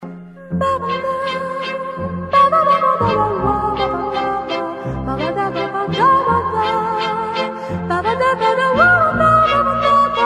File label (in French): Concerto pour une voix